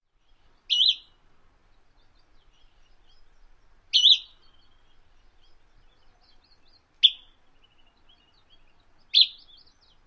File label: whistles amp other calls